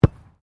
RUGBY BALL BOUNCE; Various rugby sounds; Miscellaneous Sports (Sound Effects:Sports)

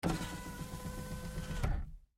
GMC Savana, Power Window, Up, Truck; 2010 GMC Savana; Trucks; Vehicles; Big Room Sound

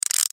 Gear Multimedia; Web Interactive; Technology; Gear Multimedia (00:02.6) 2; Noise; Electronics

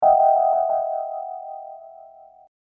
Hip Hop Dark Rhodes Licks 90 Bpm Straight E; Keyboard Loops; Lynne Music

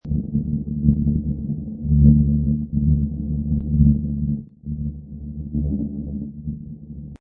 Horr Atmo Bm, Atmospheric horror loop at 135 bpm.; Horror Atmosphere Loop 135 bpm; Synth Loops

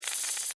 Insect, Hiss, Attack, Spray, Bug; Animals:Insects; Insect Hissing

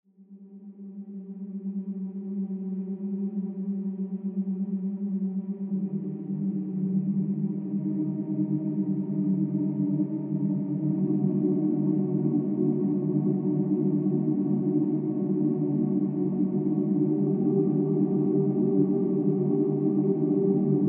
Horror Drone Ethereal Tonal Swells Dissonant; Scary Tones; Blastwave

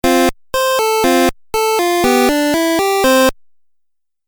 Musical Phrase Video Game

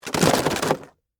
Foley, Cardboard, Box, Objects, Drop, In

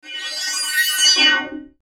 Sweeper Magical Metallic Warble; Production Element Imaging Element Accent Transition; Sweeper Sound Effects

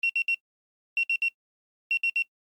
Technology Pager Beeps Alert; Telephones; Electronics; SFX Bible

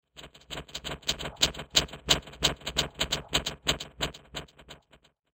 Abstract Machine Noise, Spacecraft; science fiction sound effect; Andrew Fleming

